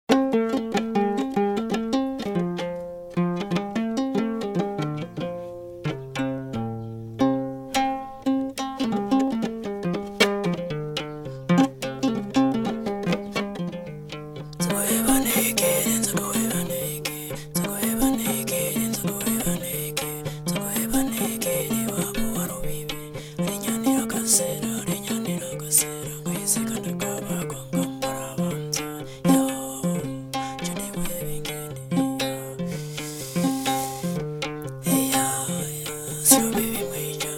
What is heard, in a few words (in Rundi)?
inanga, burundi